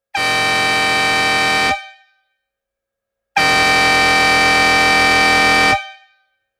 klaksón 12V 24V chrómová 208mm 2 tónová fanfára

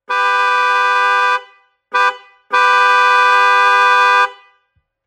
klakson 12v cierne slimaky 2 tonove

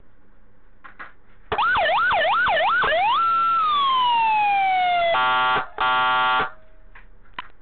sirena megafon 12v 3 tony a mikrofon

